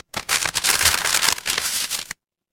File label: paper fabric crumple